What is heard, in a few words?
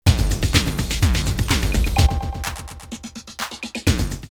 drumloops Techno House 126; No Delays?; X-Static Goldmine